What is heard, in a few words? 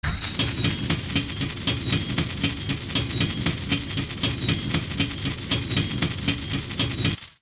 Drawbridge Down